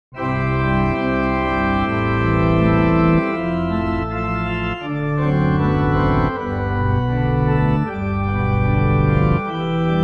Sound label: Air on the G String; Bach